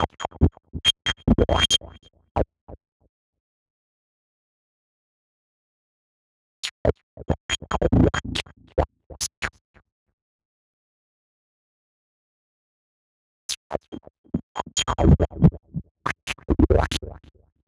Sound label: BASSE FX